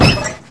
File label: car bottoming; Stunt GP